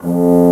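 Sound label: Bassoon F2; Digital Sound Factory Virtuoso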